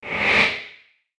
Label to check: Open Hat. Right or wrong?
Wrong